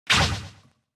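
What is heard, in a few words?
attack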